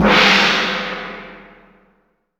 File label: chinese gong; AKAI S6000 CD-ROM - Volume 5